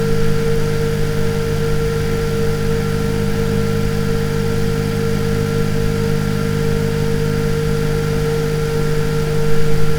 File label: Max RPM